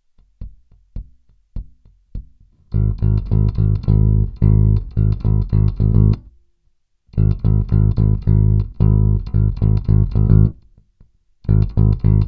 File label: bass